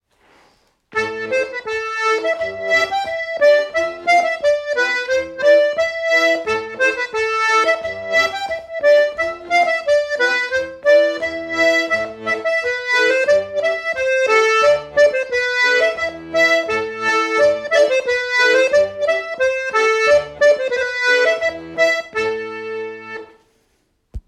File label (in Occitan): diato; Lo Companhon de l'Avairon; Musiques et danses traditionnelles